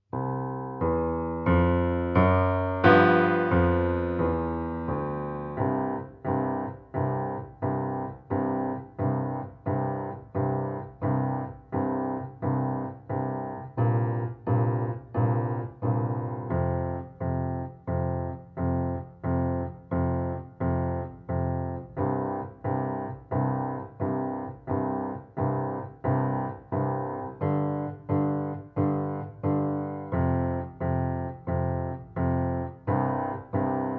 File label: Feelin Jazzy Playalong